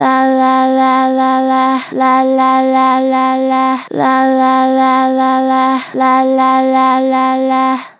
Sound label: Gentle Vibrato "Lala", Vocals, Natural Vibrato Gentle; Harmor Vocals